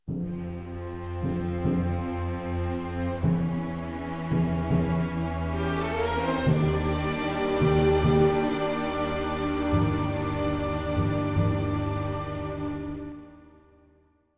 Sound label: event calm; Hidden Dangerous 2